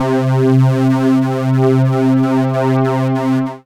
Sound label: Synths Note C